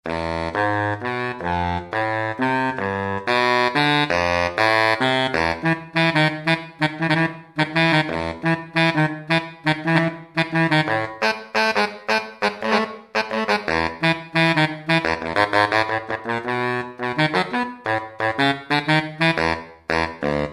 Barely Bari; Roland SRX-03 Studio SRX

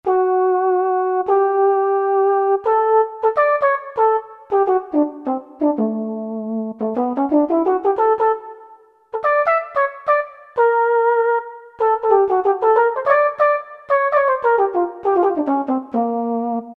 Flugel Solo; Factory Preset examples

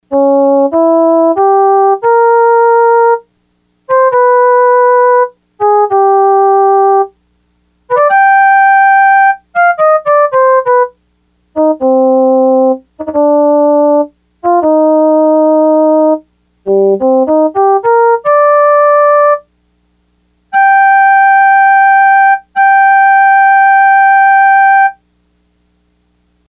Flute wood, Yamaha DX100; Factory Patches

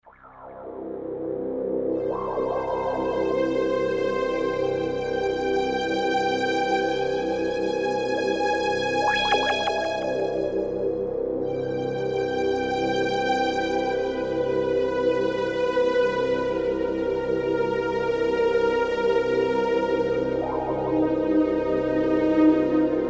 pad, E-mu PK-6; Simplex

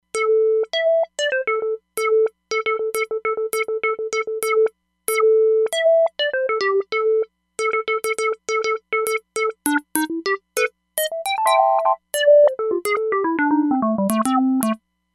Sqr Diamond, Roland Fantom XR

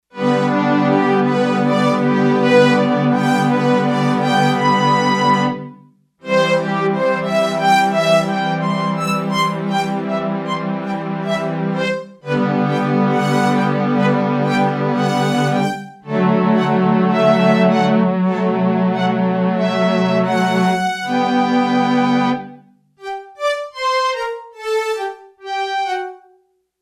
Strings, Yamaha TX802; Performances; Factory Patches

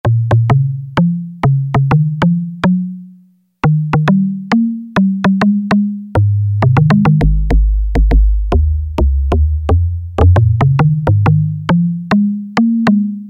Yamaha DX7 LOG DRUM

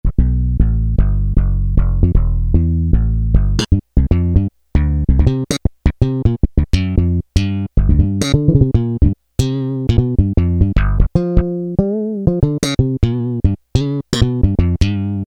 Slap Bass 3, Roland W-30; Factory Performance Patches

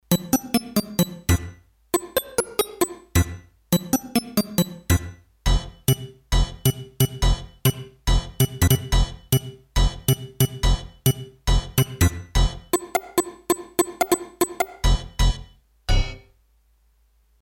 Drop Hit, Roland D-10; Factory Patches

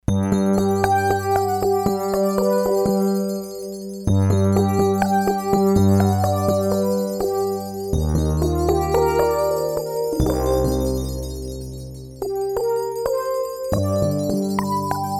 Ice Rains, Roland D-10; Factory Patches

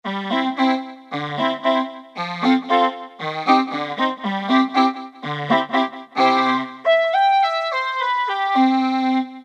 Kid Ahhh (Wow), Korg microKORG; Factory Voices

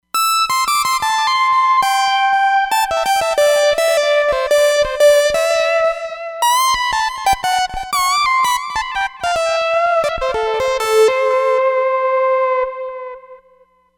DC Triangle, Roland Fantom XR

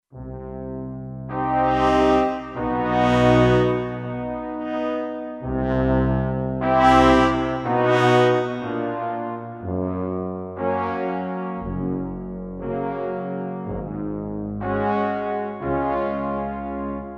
Orchestral Brass, Kurzweil K2500X; Factory Programs

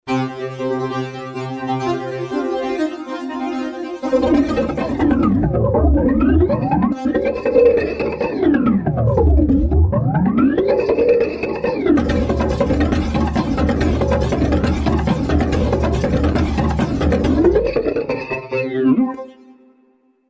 Roland V-Synth Tribal; Factory Patches; Tribal SBF

